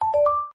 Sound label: notify